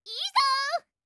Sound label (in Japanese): 攻撃